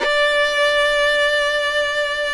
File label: FIDDLE NOTES, D5, R